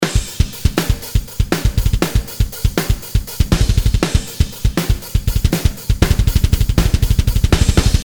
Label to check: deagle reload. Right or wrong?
Wrong